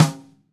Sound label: Radio King Snare F Room; Sonic Foundry (Sony Creative Software); Vital Drums